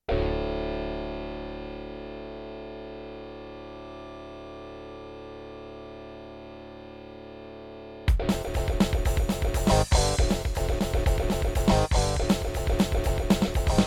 turtlebeach tropez; soundcard test; Doom

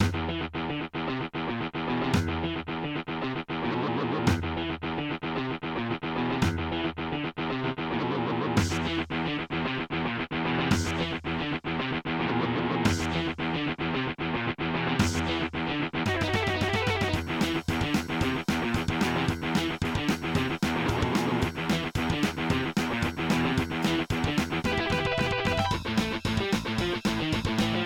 aztech sg wr pro32 ii; Soundcard test page; AZTech SoundGalaxy Waverider pro 32-3d(i); doom

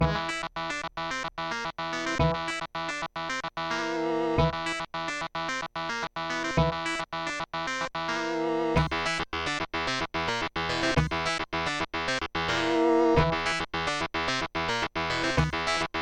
nvidia nv1 opl; Nvidia NV1 (OPL2 mode); soundcard test; Doom

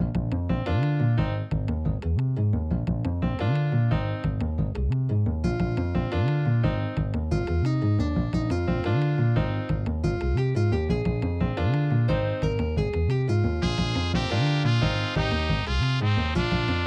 level1, Kawai GMega; soundcard test; Hocus Pocus

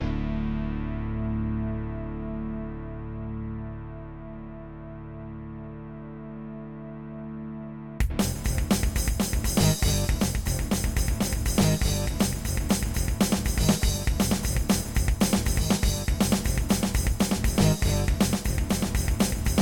yamaha db50xg; soundcard test; Doom

